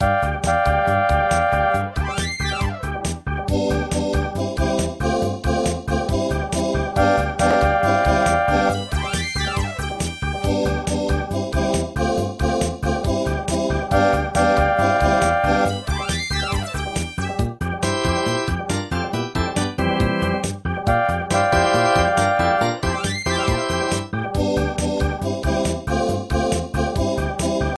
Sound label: Train Theme